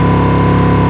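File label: Engines low loop; SuperBike2001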